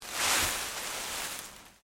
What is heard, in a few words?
LEAVES MOVE MINOR, Rustling; Material components for constructing more complex sounds; Objects